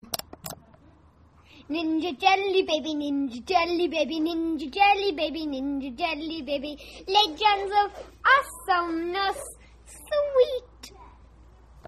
ninja rap

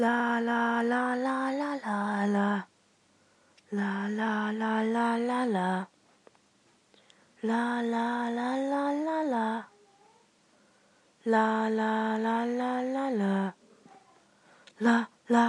lalalalalalal

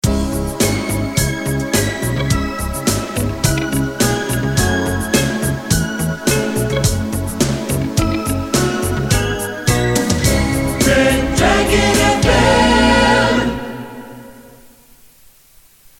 jingle; RDFM

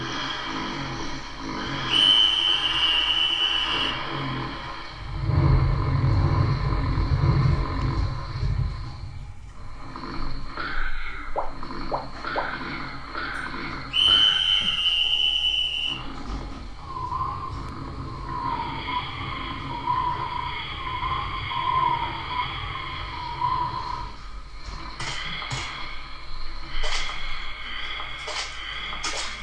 sound effects recorded via cam mic

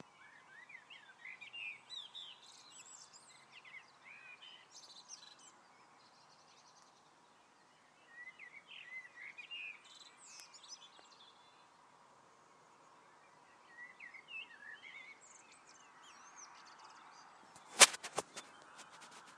yorkshire birds on a sunday evening

